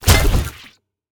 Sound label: zombie bodyfall; smite; ahpuch skin10